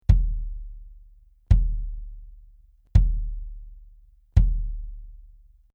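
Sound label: BASS Clr Soft Felt, bass drum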